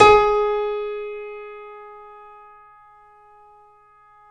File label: KEY MCP, YC7 Piano ff; Roland L-CD701; ff YC7 St